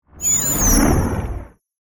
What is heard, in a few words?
Sweeper Flange, Sweeper, Flange, High, Pitch, Ring, Production Element, Imaging Element, Accent, Transition; BlastWave